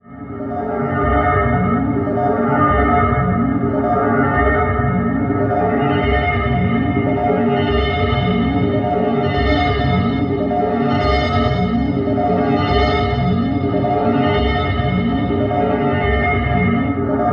BLEEP 3, SPACY BLEEPS; Zero G; Malice In Wonderland

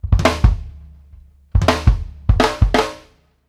SETUP, Drumworks FILLS 84; Sampleheads; New York City Drumworks VOL-1; KD FILLS 84

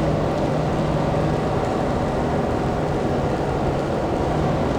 Cracklature, sparse soundscape

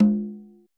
tom, Roland MC-909

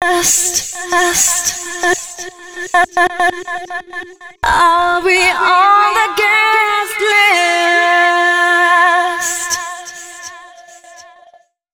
female c#, Dance Ultra Voice FX; Mutekki Media

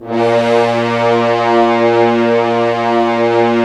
Horns; Roland - LA Composer vol. 4

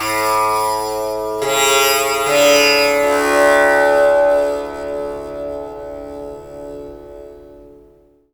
TAMPURA; Spectrasonics; Heart of Asia

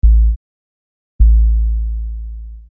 Low Sub

